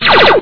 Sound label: bang; bubble shooter